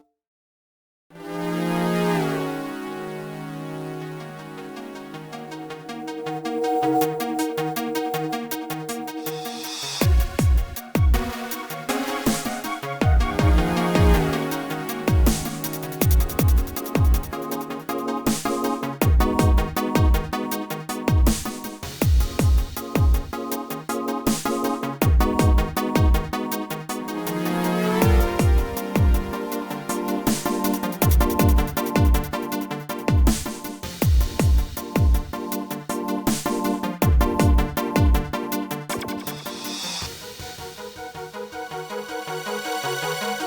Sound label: Map Music; Outer Rim⧸Bay Parking⧸Mountain Pass; YuGiOh Over the Nexus